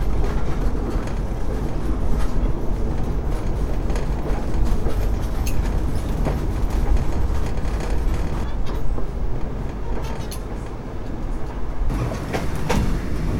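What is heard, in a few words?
train, exterior; ENV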